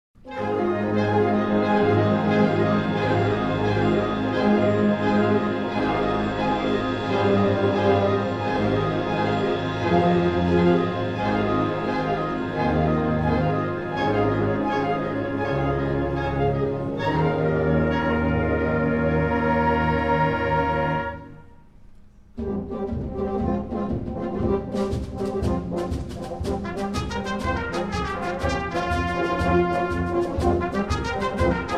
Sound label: orchestra; Вострая брама